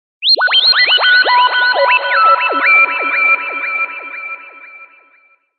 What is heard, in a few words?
Magic Sms